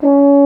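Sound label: baritone C#3 horn; InVision Interactive; Lightware VOL-1; Instruments & Percussions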